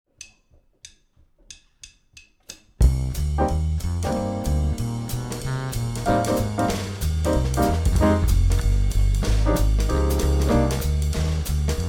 minor groove backing only 4 choruses, jazz wind

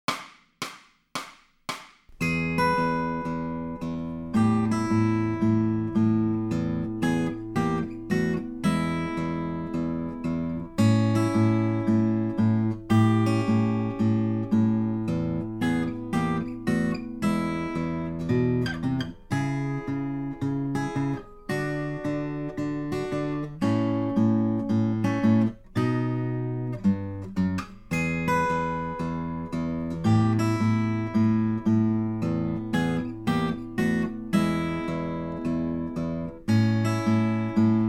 Hobnail Blues, acoustic guitar; Grade 4

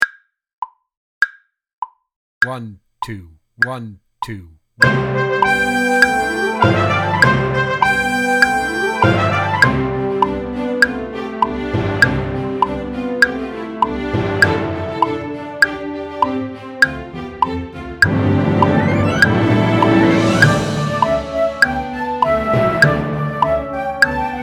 March for One Click, drum kit

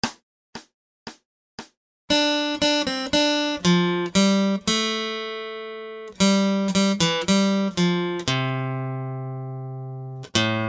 Acoustic Accompaniment, acoustic guitar

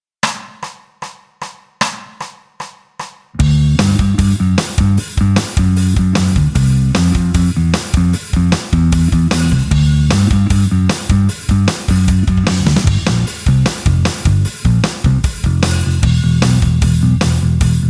Rhythm Guitar Playing Backing Track; electric guitar; electric guitar performance diploma

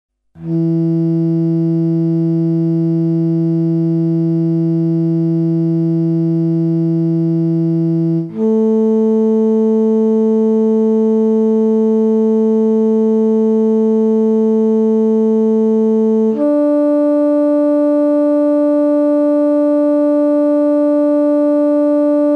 tuning guide, bass; guitar-bass

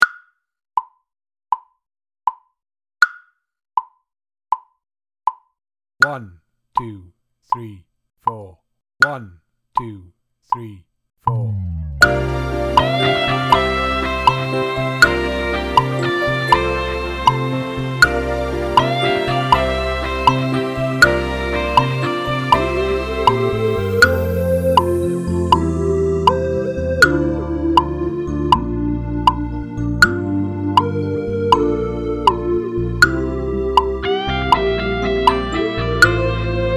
Gospel Click, drum kit; Jacks

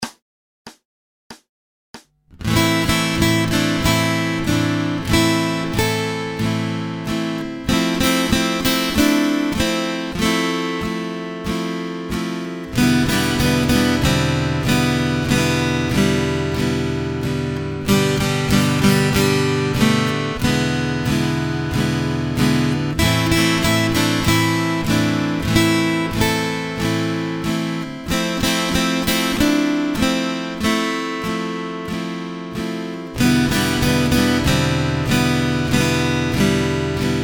Acoustic Accompaniment, acoustic guitar

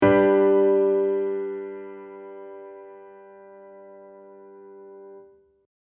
Aural Tests Recog of chords; Grade 3; pop vocals

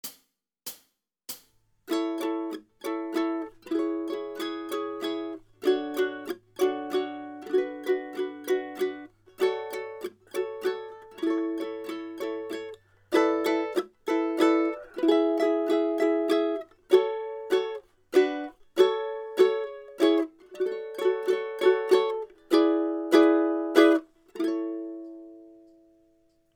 rhythm study, ukulele; grade5

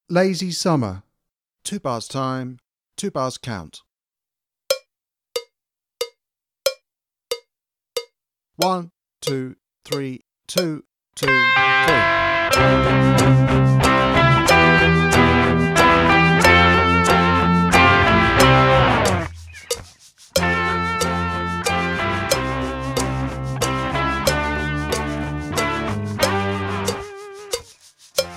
Lazy Summer Backing, drum kit

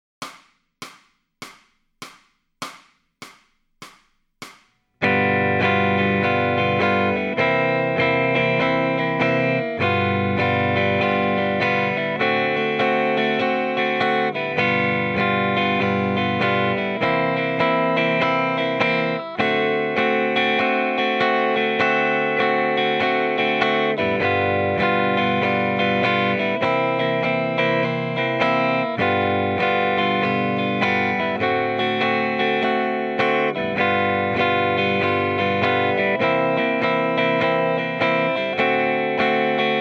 Lead Backing Track, electric guitar

